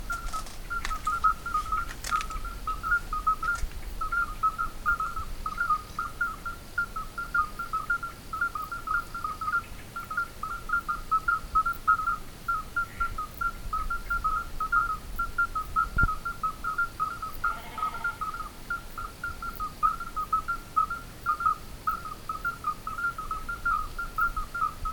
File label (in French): Chant